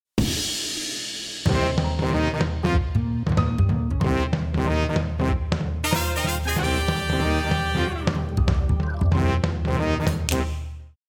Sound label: brass